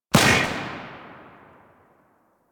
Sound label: Rifle Shot Secondary A; Weapons; Warframe; Tenno; Bard Rifle